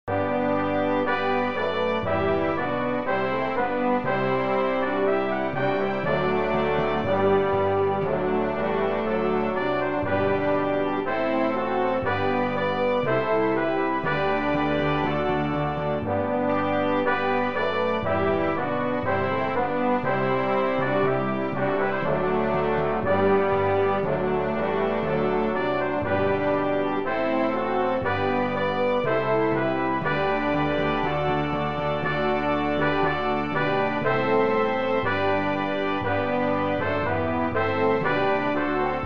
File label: Hymn Brass